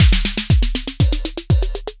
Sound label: Lesson Fill 120; Fit Boxing presents Hop Step Dance